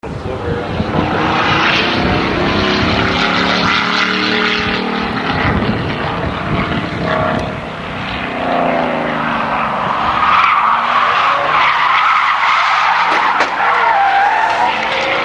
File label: Crash, Racing; Shannonville